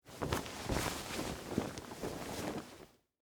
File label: Short Sleeve Off, Clothes, Shirt, Take; Foley; Dressing Sound Effects; SoundEffects-BlastWave